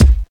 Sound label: clear steel kick drum c key, kick single hit tuned to the C note